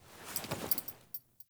Foley Action Default Polearm Wind Up, Foley Default Clothing; Characters; Player